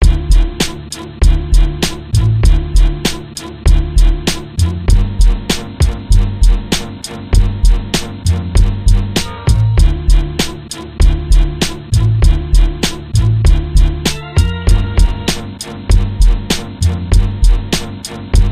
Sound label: beat